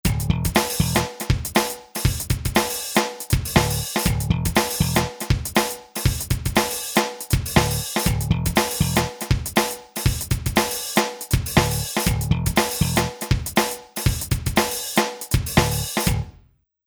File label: Funk